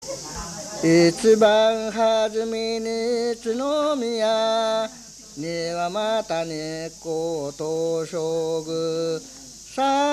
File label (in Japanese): itibanhajime, minyou; 一番はじめ; 遊戯歌; 市原市; 不入斗